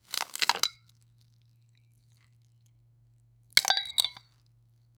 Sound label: start with long silence, biscuit